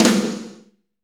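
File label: snare, Techno Rock; Northstar; Drumscapes Roland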